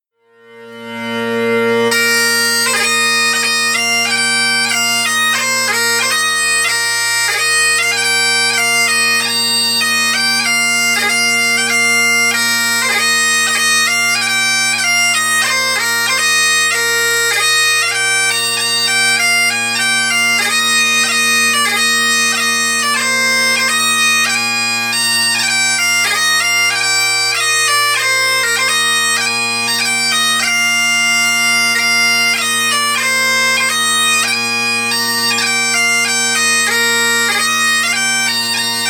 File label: pipe tune; churches